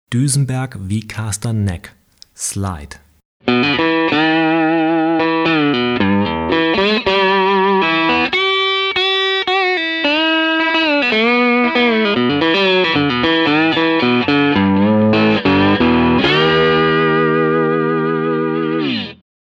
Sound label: Slide; Duesenberg V-Caster, Neck PU